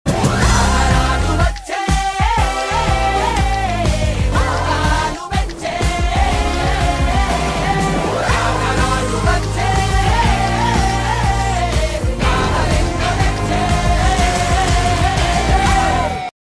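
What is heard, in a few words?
background music; raja raja chora; srivishnu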